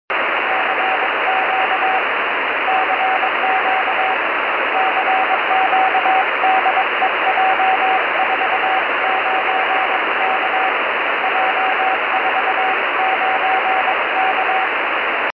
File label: 70200 khz; j49k; pe1itr; 70mhz